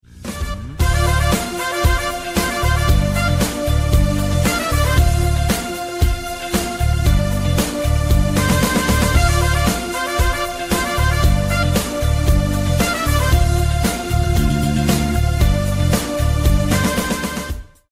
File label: Ringtone